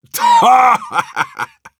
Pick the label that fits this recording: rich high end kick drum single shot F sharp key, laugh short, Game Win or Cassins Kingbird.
laugh short